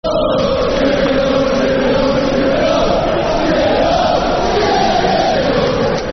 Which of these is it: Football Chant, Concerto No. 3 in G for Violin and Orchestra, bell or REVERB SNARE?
Football Chant